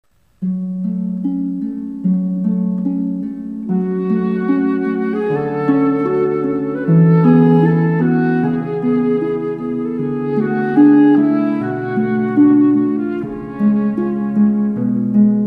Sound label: pavane, harp